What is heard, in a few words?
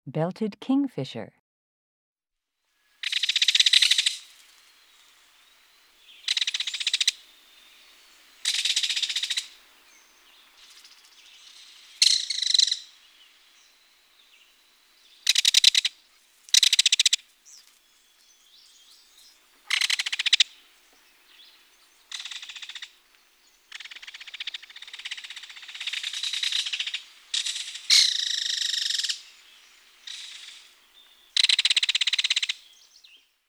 Belted Kingfisher